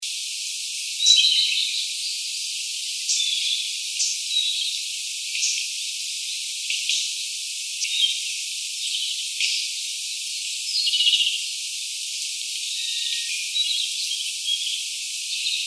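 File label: koruri S, Siberian blue robin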